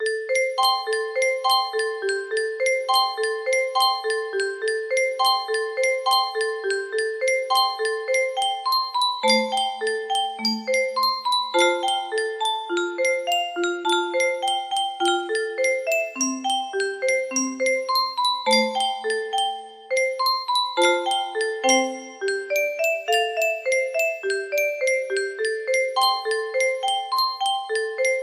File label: steel guitar